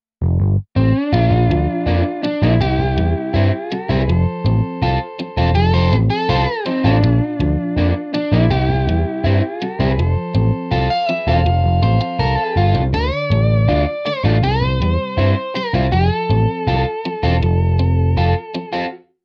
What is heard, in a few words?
Slide Guitar; MidiGuitar